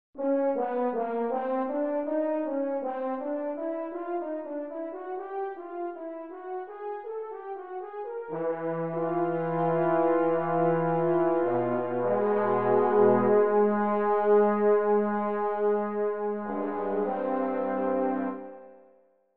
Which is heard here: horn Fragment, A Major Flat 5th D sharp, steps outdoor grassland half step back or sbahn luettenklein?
horn Fragment